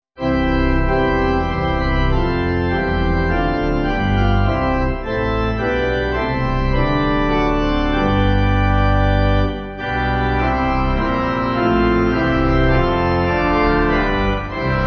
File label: Pipe